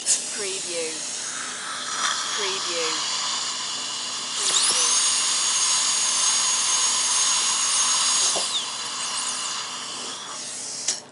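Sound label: Dentist Drill Cavity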